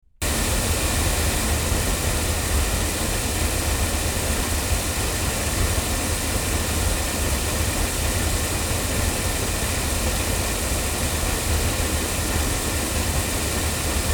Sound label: Oktava MK319A loudspeakers